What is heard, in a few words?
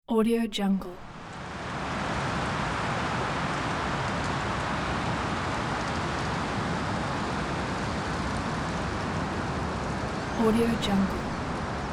wind in forest trees; alexleadsounds